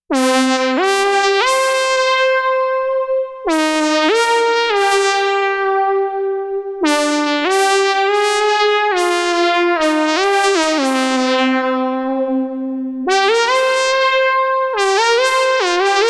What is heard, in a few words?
MOOG MAVIS Phase Folded Lead w Stereo Spring Reverb